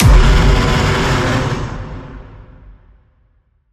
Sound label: Chimera Fiery Hit